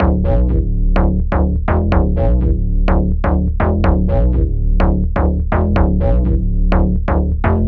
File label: Bass Dm 125Bpm, Techno; Bass Loops; Ribbon; Ghosthack Advent Calendar - Day 6 - Techno Kit